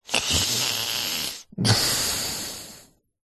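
human male asleep breathing interruption nasal snotty; zapsplat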